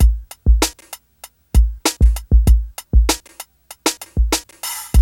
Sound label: COMBINTN LP, BREAKBEATS; Crypton - DrumBase5000; BREAKBEATS#4